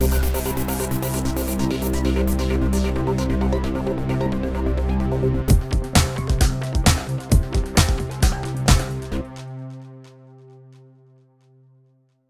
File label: Signature Sound; FXHome; Signature Tracks